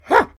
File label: attack